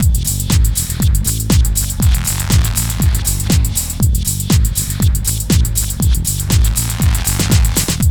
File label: GHET ROL